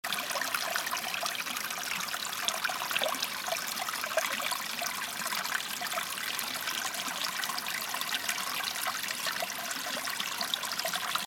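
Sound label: Potok, stream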